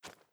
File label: jump; svencoop; crash bandicoot